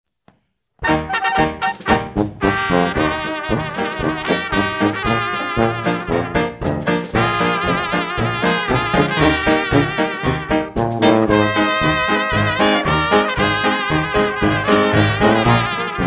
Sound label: POLKA